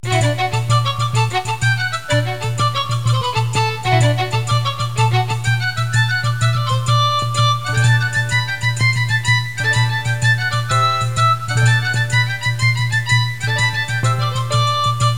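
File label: elevator music; ProjectMobius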